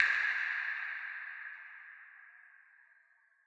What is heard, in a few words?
incinerator dirac